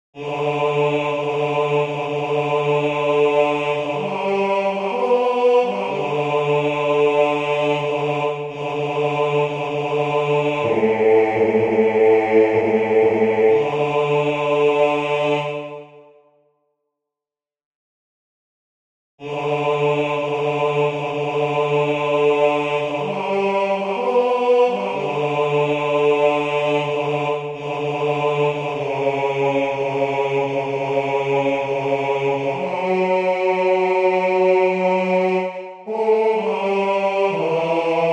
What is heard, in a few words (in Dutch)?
Bas, koor; Kerstnacht; Cantique de Noel